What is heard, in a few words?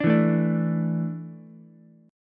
E 7th Suspended 4th, Ukulele; E7sus4 strummed; JGuitar